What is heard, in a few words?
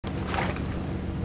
draw, weapons; airburster